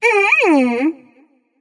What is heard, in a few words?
tongue light; smite; voicepack; hel